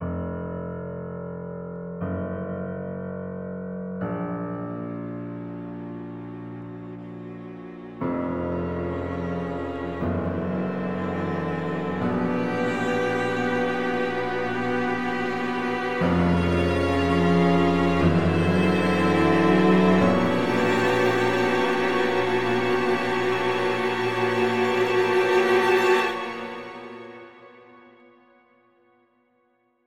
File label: Dramatic